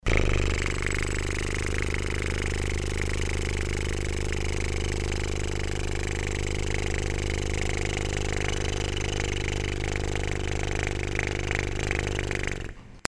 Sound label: blow